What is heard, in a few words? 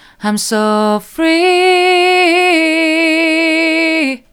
soft, vocal